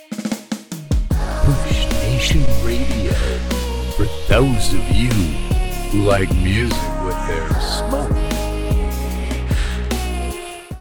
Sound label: Smooth Chill